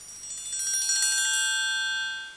mettre goutte; French Version

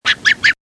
EPONGE

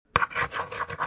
CRAIE

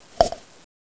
poc

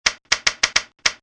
lettre; Mobiclic51

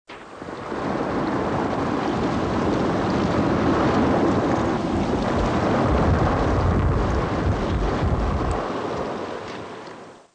éruption

